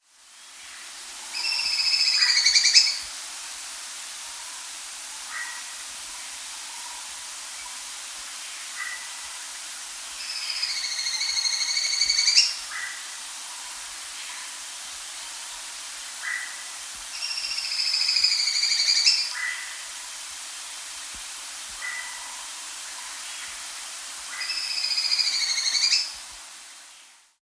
White-Throated Spadebill (Platyrinchus Mystaceus), Andean Birds; S. America